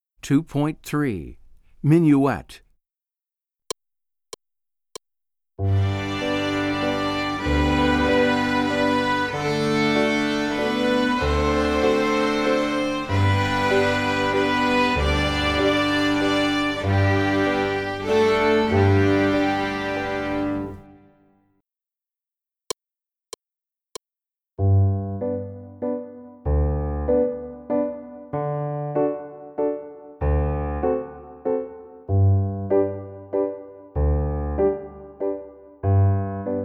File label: Minuet